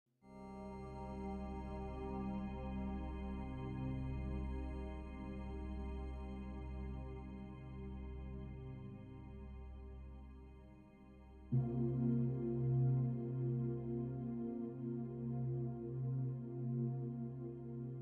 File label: ambiente